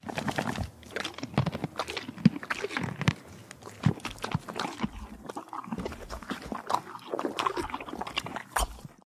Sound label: Horse eating apples and carrots